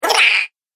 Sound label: Horror Gremlin, monster